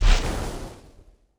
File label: card shining